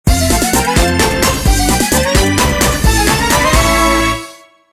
Jingle Chance

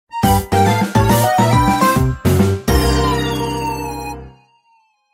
Minigame Draw; MP4

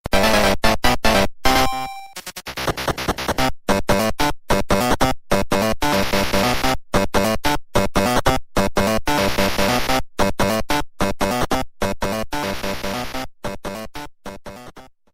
Bonus Game

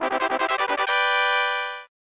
Fanfare

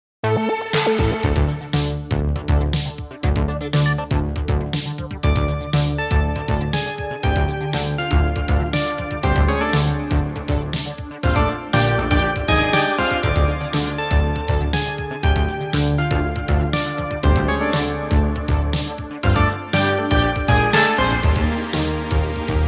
Factory; SMBW

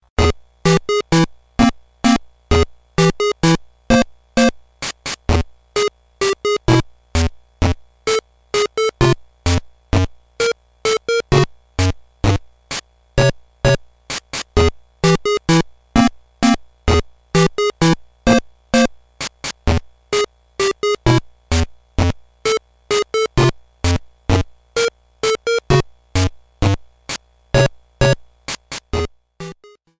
Time Bomb Ticks!

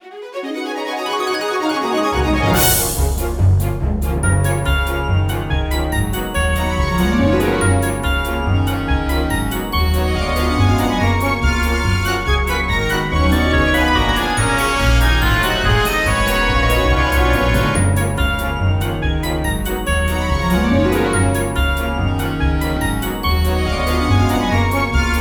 Minigame Start; Super Mario Party

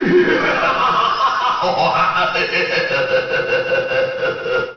Laugh; Crazy Hand; Melee

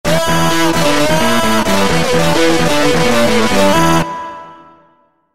Guitar; Donkey Kong 64; Gazump; HD

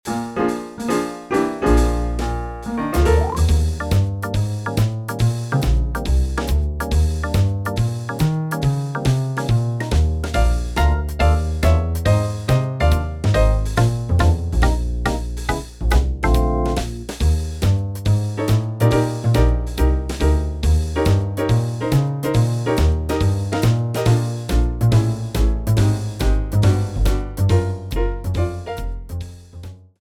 Minigames; SM64DS; Luigi

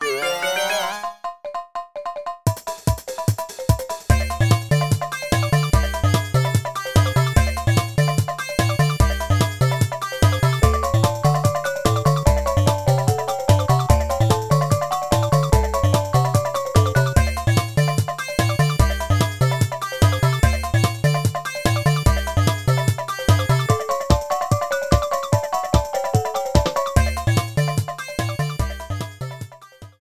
Fire

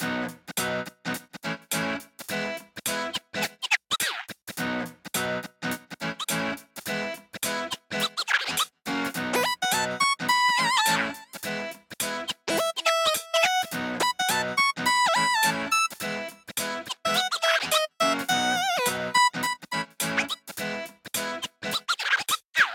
Local Wireless Loading into Room

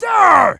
Attack sound effect; PMTTYD

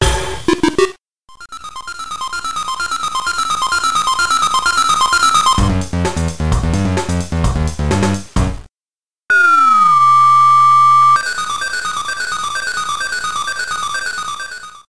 Lose

